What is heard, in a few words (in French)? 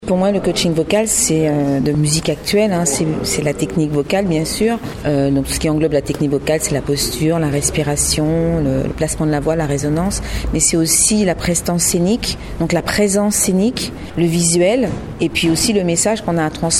Concert rock